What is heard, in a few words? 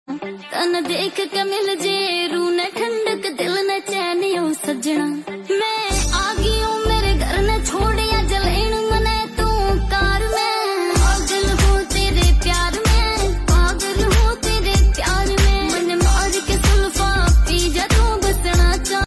Ringtone